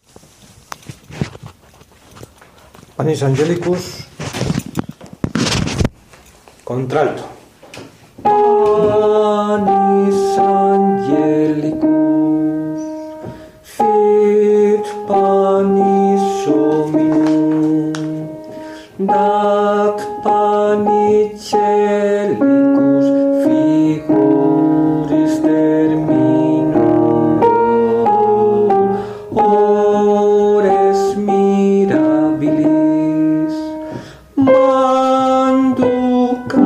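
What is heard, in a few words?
alto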